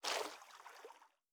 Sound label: footstep water light; Rust